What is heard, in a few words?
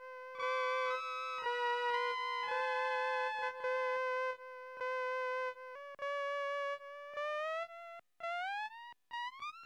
sk-2 flute env